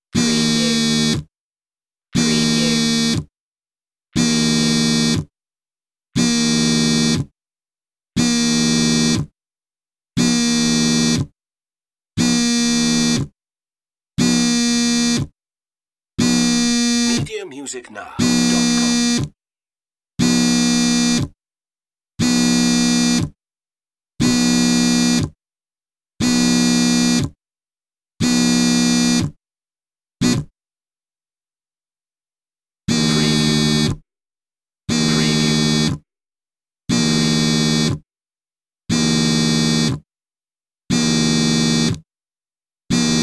phone vibration on table

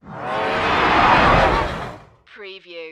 Space Demon Horse

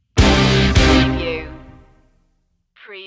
drama heavy stinger

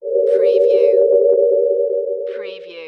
Amb oldtime

